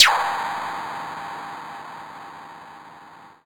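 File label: Pit Cymbal; Quasimidi Rave-o-lution 309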